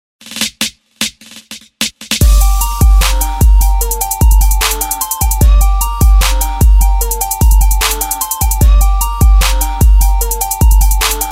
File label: Bounce